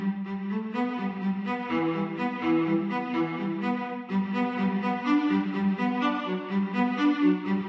Teaparty-Cello-Arpeggio; Angry Birds Seasons

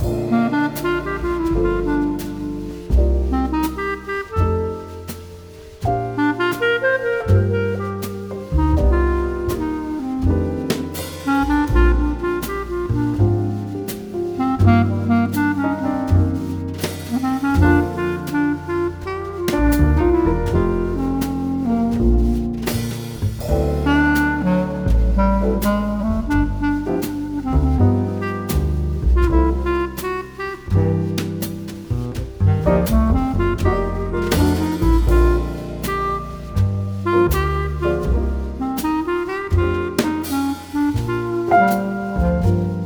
mus upgrade shop jazz; stranglehold

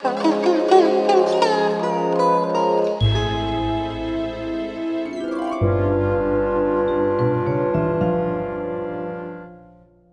Bari Sax 120; simpsons hit run; ps2

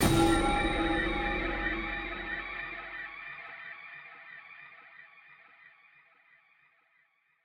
attacked perc only end stinger; Baby Wendigo

